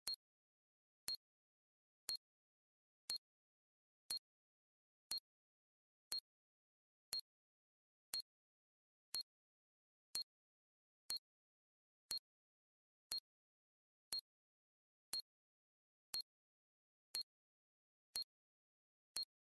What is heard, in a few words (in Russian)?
pisk taimera vzryvchatki